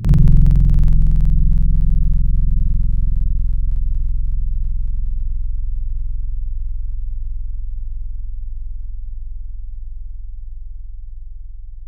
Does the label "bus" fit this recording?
No